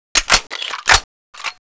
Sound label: gun switch single; alyxgun